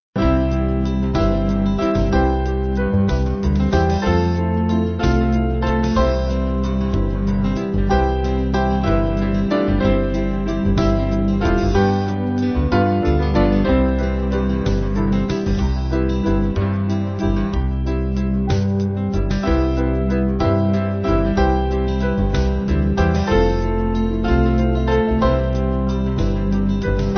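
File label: Band, Music Backing Files for Churches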